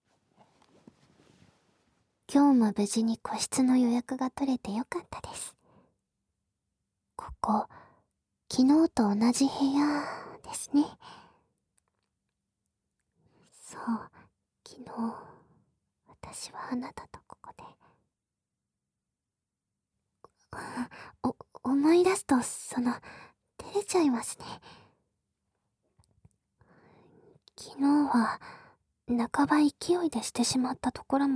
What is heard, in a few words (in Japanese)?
癒し系爆乳JK お寛ぎあまあま耳舐めご奉仕; 癒し系爆乳JKアメ